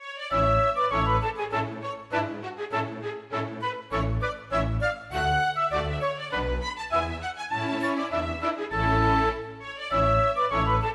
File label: Gallopade; Ursin; storgårdsmusikk